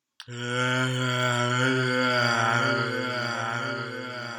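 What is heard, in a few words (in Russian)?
очень глупый монстр с эхом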